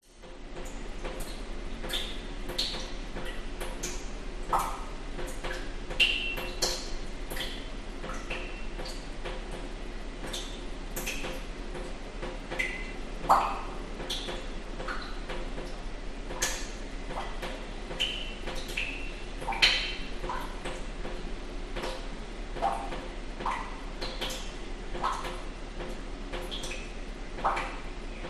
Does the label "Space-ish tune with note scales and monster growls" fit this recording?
No